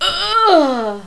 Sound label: death, female; kingpin loc